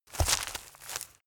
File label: step forest walk